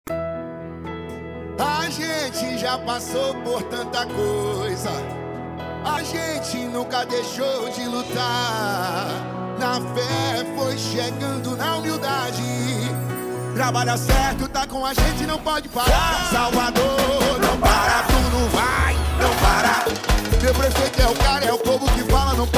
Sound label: jingle